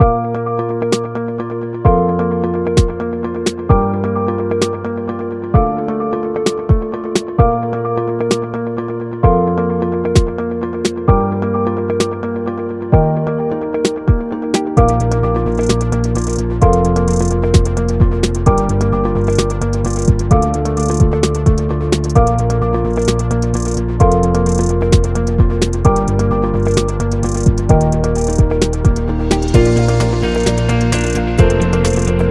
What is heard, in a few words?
Elegance